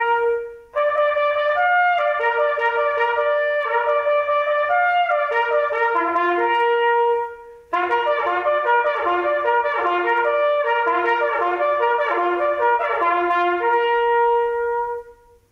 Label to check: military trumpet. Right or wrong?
Right